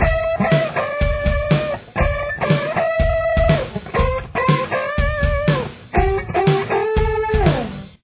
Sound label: intro lead